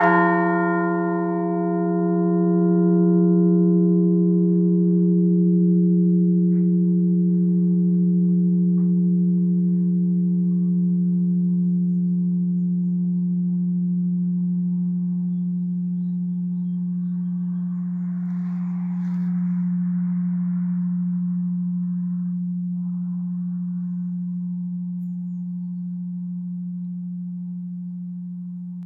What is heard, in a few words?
old tenth, bells; brighton